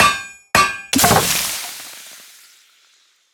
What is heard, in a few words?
anvil use; Minecraft; Conquest Default